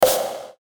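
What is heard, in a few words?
Musical Element, Drum Snare March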